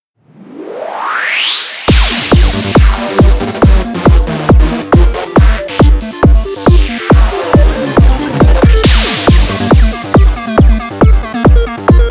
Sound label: synth